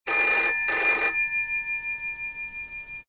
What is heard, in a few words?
bell